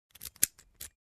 Clicking Lock

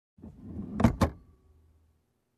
Adjusting Car Seat

